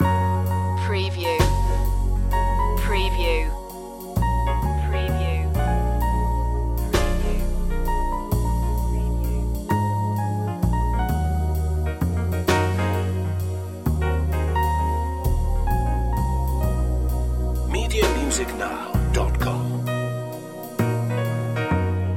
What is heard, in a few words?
piano jazz jam loop